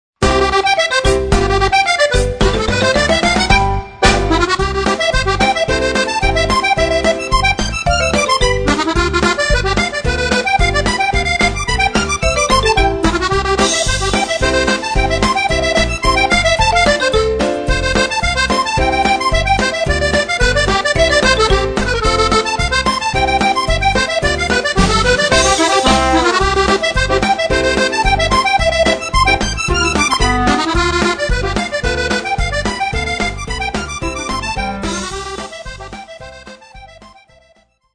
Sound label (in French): POLKA JURASSIENNE